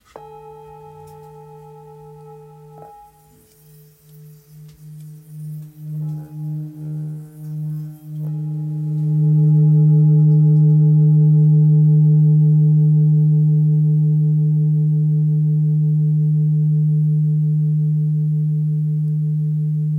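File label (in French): Ancien ré 585g, Bol Chantant Tibétain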